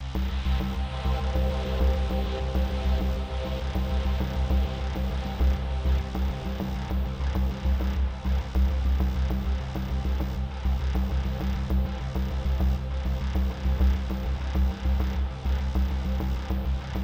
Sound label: Music; Void Bastards